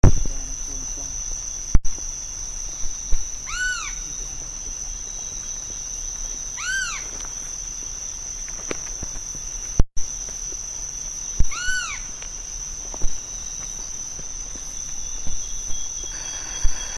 egothele des moluques, chant

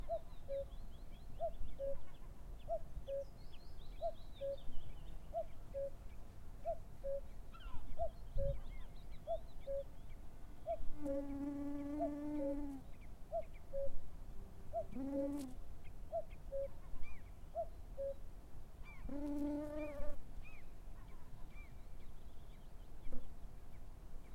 coucou gris, chant

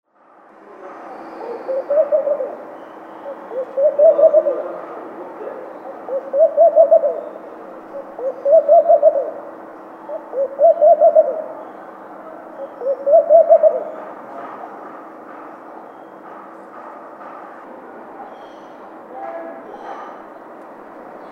tourterelle maillee, chant

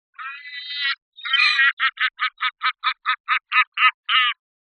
goeland argente, chant

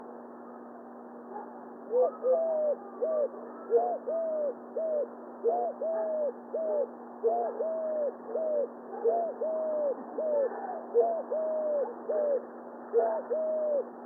tourterelle turque

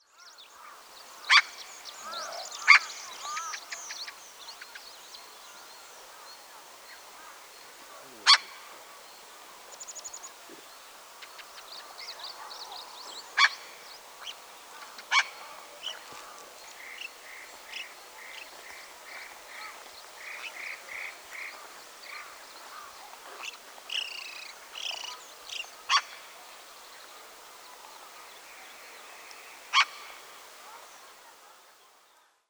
pie bavarde, chant

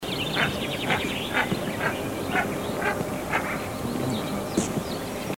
harle huppe

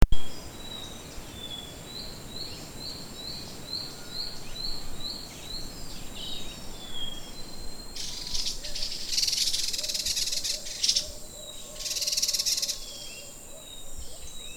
salangane des palau

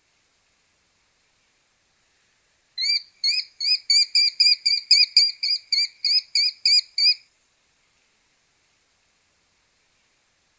epervier a cuisses rousses, chant

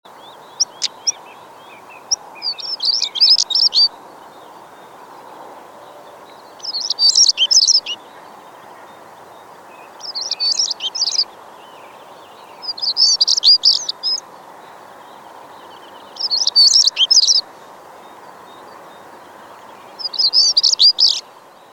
tarier patre, chant

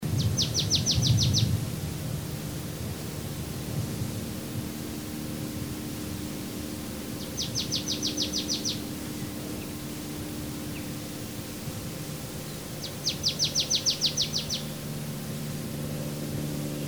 mesange nonnette, chant

